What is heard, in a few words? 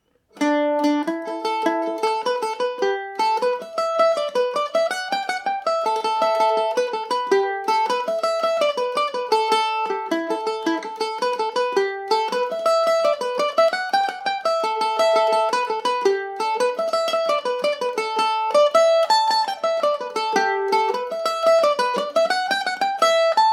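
A dorian, Irish mandolin; lilting banshee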